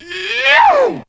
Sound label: VOX; Zero G; Man Machine